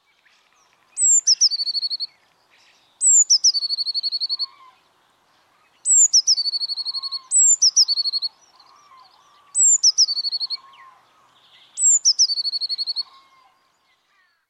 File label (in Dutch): pimpelmees